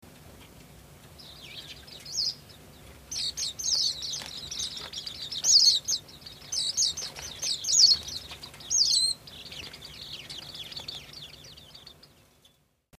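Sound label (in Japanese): mejiro, Japanese white-eye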